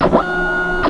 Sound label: menu win slide; sfx; starship trooper